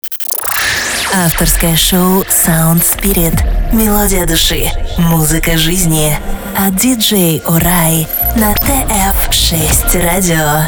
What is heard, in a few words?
radio jingle